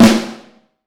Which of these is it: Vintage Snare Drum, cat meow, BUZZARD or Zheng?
Vintage Snare Drum